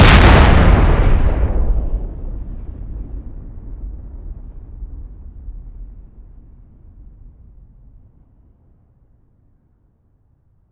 explode

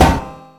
epic metal hard

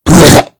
pain, mummy

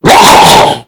die, mummy

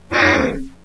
attack

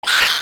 demon wake; nh demon

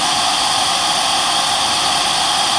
jet, thrusters

